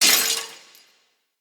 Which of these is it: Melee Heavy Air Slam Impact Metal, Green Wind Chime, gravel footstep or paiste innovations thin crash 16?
Melee Heavy Air Slam Impact Metal